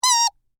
Squeaky Toy Single; Foley; Miscellaneous Toy Sound Effects; SoundEffects-BlastWave

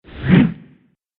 Whoosh Slow Motion

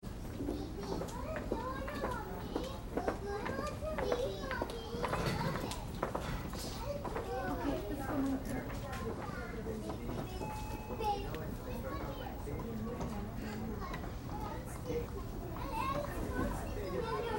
Crowd Waiting Area Feet Busy, Hospital; Sickkids; Hospitals; Big Room Sound

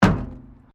METAL BIN IMPACT PLASTIC CRASH BARRIER

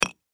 Tool Chisel Impact Rock Hit Hammer; Hand Tools; Foley

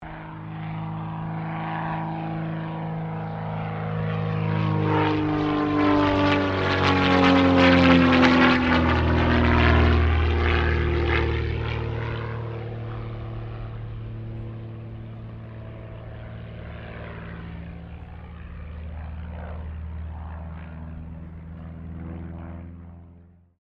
AIRCRAFT CT4A PASS, military trainer aircraft; Airplane Sound Effects; Airplanes (Sound Effects:Vehicles)

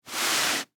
Rag Wipe, Foley, Rag, Wipe; Cleaning Sound Effects; Household; SoundEffects-BlastWave

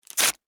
Office Receipt Tear Paper Fast Rip; Offices; SFX Bible 6

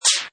Sweeper, Button sound; Multimedia

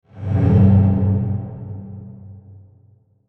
SWELL DARK REV, Swell Dark Reverb Tail; Production Element Imaging Element Accent Transition

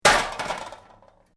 Metal Debris Impact Short Medium; Small metal junk crash

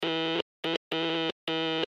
Morse Code Y Letter; Blastwave

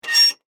Squeak Metal; Texture; BlastWave

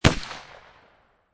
Pistol Rhuger 357 Single Shot Exterior At 45'; Gun / Pistol: Rhuger 357, Single shot, Exterior, at 45 feet distance. Version 3.

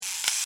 Scifi Servo G Mechanical Robot Small Motor Toy; BBFX

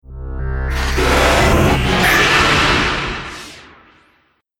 Trailer Hit Glitch; Trailer Sound Effects

